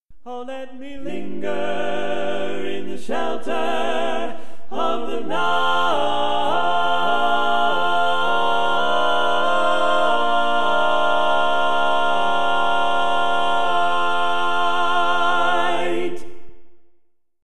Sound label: Bari, barbershop tags